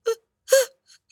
moan

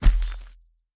Footsteps Dirt Walk; The Town

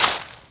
Plant Collision; Angry Birds Rio

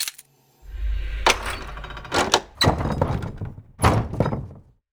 key Door open

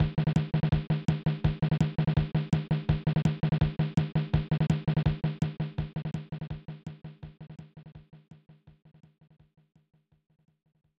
Drumbeat; 1994 snes; fun n games

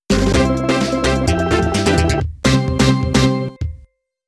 Victory; ADK; Ontama

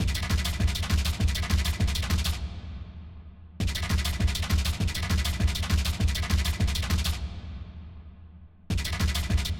Drum Layer

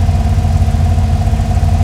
Gelik Low, car; gamerip; Car Simulator 2; android

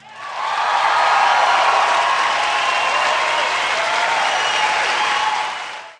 race finish

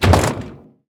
metal double doors close; Devour

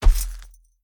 Footsteps Dirt Walk; Devour; The Town

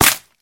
Platform Break; Doodle Jump 2

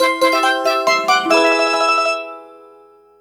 All Star Coins Collected; gamerip

